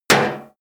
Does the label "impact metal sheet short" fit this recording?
Yes